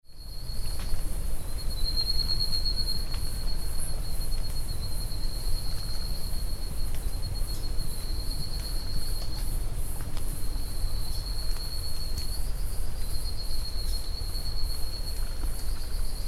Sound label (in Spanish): bosque